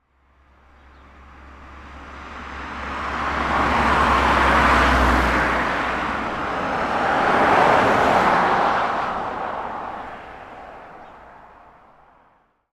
transport three vehicles pass close by country road